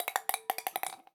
Ping Pong Rattle, Large Wine Glass

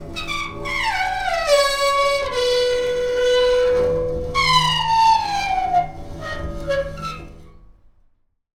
Pane Of Glass Rub

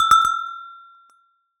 Cowbell Chain, Percussion

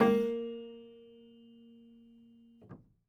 Prepared Piano

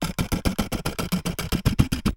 Camera Sequence

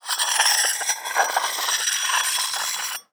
Hole Saw Scrape; Hole Saw Bits

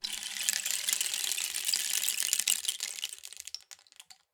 Rain Stick, Percussion Samples

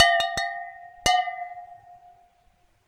Water Clink, Glass Clink In Water

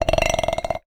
Bolt Glass Scrape, Medium Wine Glass

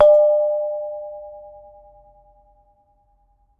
Bonang, Gamelan

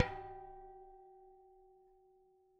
Bicycle Spoke

